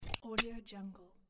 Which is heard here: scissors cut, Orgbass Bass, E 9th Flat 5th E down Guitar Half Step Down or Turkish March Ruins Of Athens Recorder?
scissors cut